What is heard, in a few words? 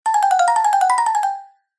cartoon footsteps fast scurry scarper warm mallets